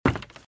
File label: Footsteps - Wood - Creaky - Run - Right